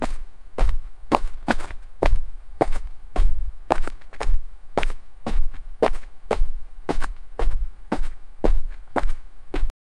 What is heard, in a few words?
realistic fast running fo